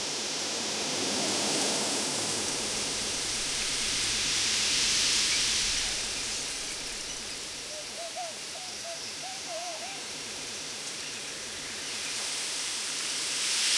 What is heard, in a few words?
seamless ambience tropica; afternoon